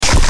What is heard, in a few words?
music